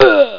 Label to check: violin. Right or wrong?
Wrong